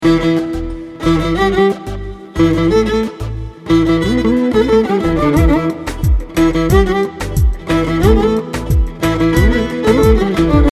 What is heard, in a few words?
Swing with strings